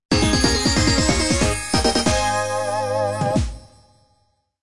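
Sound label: Battle Fanfare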